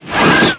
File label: Zipper; Playable Tutorial Level; TR2